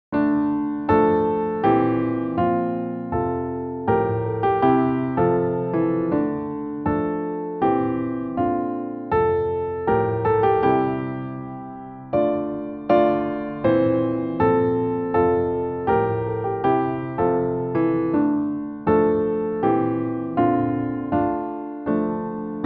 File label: Piano; By Thy Mercy; Indelible Grace Hymnbook